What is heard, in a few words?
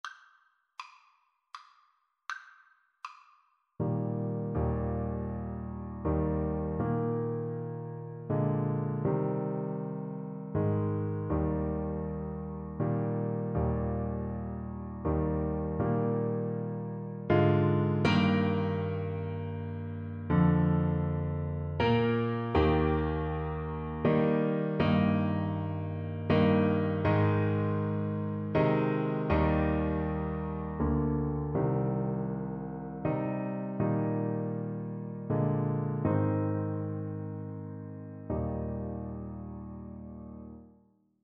Trumpet